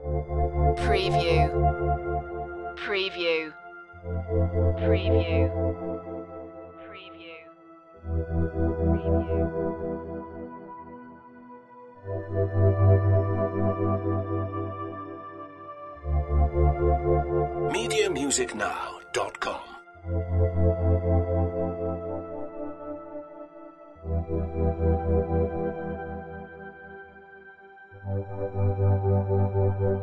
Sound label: dreaming underwater loop